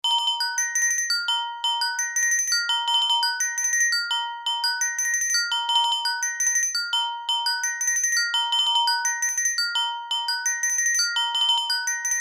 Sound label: Nature